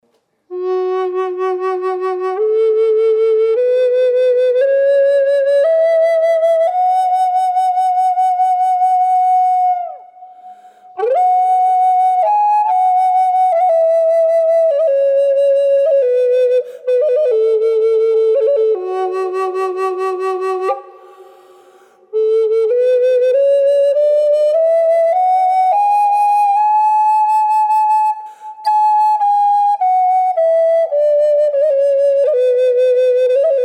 native american flute cocobolo fsharp minor reverb